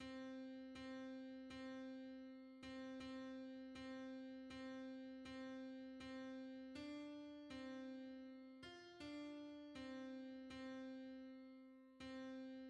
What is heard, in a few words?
alto; hymns; In the Lord we have Redemption